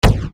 Bass Dr, EuroDance Bdrums

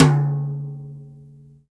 tom sharp, natural kit; Rhythm Section

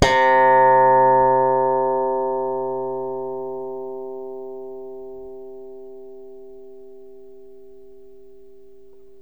SLAP BASS, Electric Basses

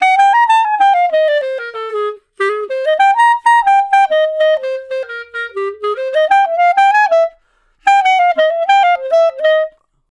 Clarinet Solo; Jazz; Traditional; Clarinet Loops; Sonic Foundry (Sony Creative Software); Jazz Solos and Sections

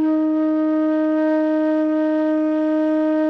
FLUTE MF D#3; Greytsounds - Philip Wolfe David Crozier's Saxophones

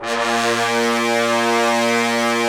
BRS, Roland LA Composer; Roland - LA Composer vol. 4; Tbns

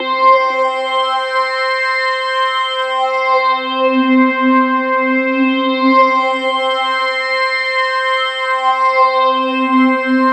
Roland JV 2080 LFO C4; MISSION LFO

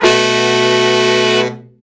BRA SECT; BRA SECTIONS

